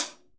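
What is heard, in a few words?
hi hat; Best Service Gigapack 2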